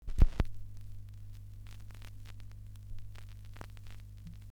Vinyl Needle drop

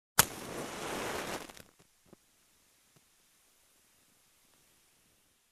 Match lighting up

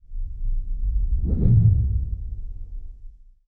Muffled underwater sound

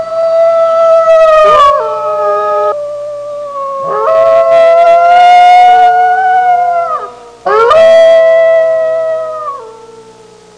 Wolf pack howling sound effect

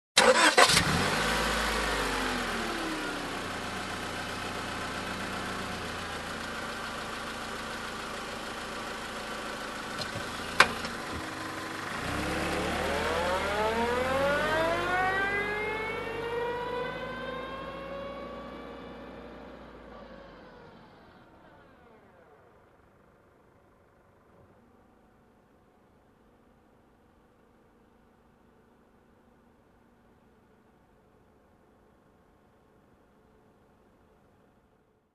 Car engine ignition and backing off

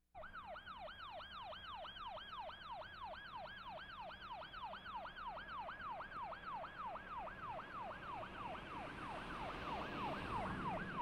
Ambulance on siren approaching

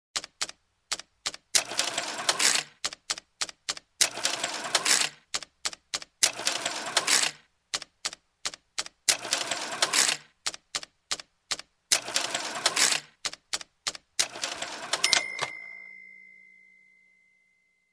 Cash entering items and opening ting sound

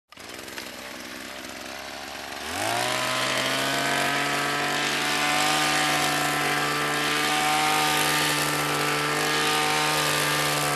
Leaf blower

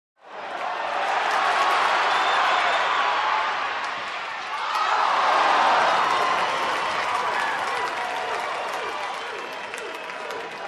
Sports Game Crowd Cheering

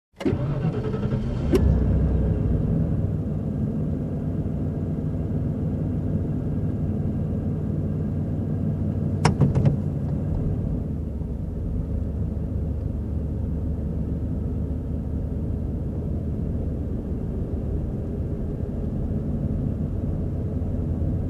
Calm car ride

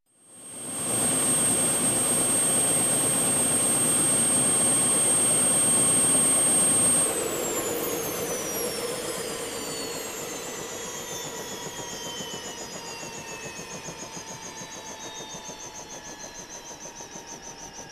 Helicopter engine shutoff

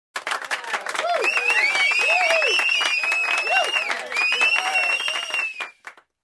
Crowd Cheers and Whistles

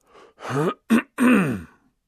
Adult Male Clearing Throat

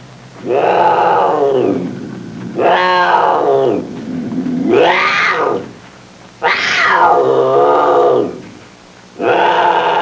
Leopard growling menacingly

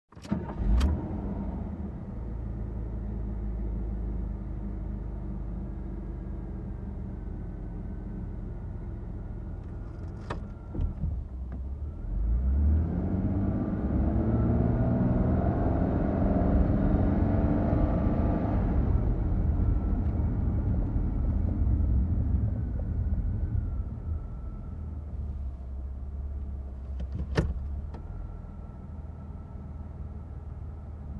Driving Car

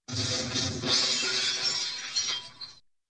Glass shattering in slow motion

